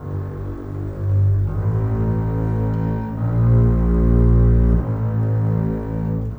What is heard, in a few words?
Cinematic Strings, Orchestral